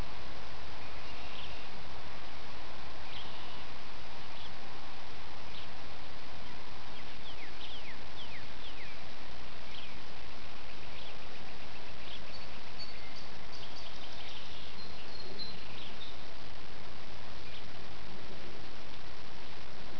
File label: birdsong